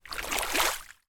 boot in deep puddle movement; foley

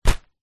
household umbrella close grab hand

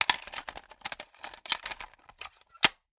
Cassette Tape Box Handling

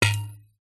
impact fluorescent light bulb no smash; zapsplat

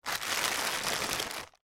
thin tarpaulin movement

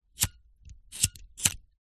household cigarette lighter flint flick x3

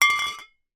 foley 2x wine bottles full bash together

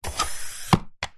office chair lever down slide hydraulic

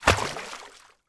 water splash fist punch; sound effects one

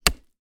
industrial axe hit tree stump; zapsplat

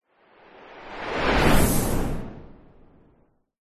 whoosh

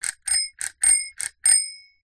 transport bicycle bell ring

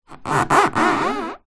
wood squeak polished floor boards hand; foley

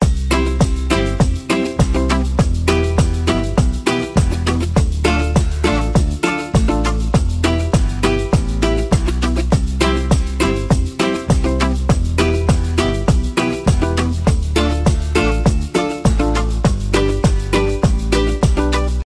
music Happy; orlamusic

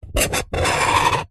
knife tip scrape metal surface sharp; zapsplat

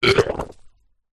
human burp soda

